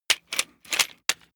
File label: metal handling mechanical click slow